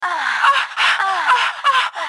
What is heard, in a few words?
sms; gta 4 porntone